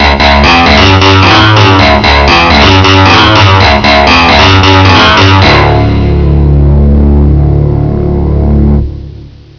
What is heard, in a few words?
SYN RIFF